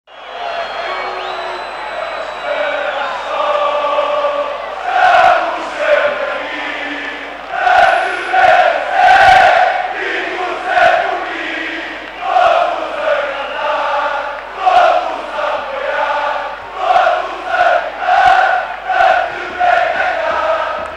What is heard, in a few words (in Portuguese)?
Nunca Estaras So, Chants, porto